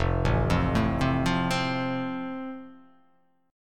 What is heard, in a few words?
F Major, F Chord; arpeggiated